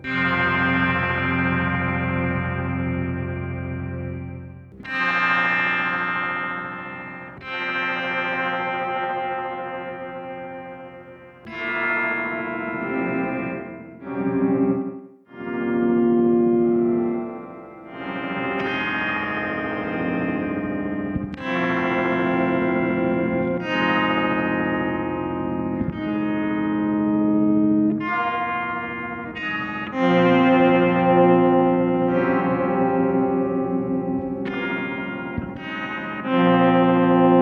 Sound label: bowed; eq; norm